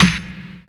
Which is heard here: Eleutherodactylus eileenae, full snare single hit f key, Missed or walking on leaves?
full snare single hit f key